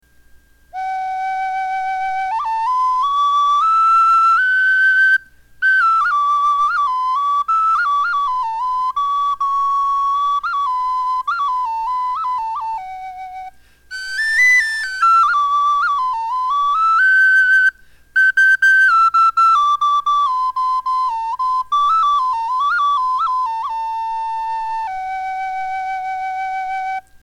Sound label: Tiny Elder, flutes; windflowerflutes